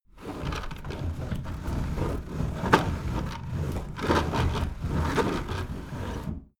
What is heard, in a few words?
window roller shutter close medium